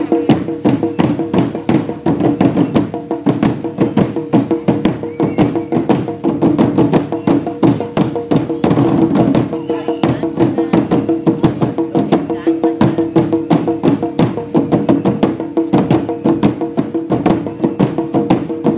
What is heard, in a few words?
taiko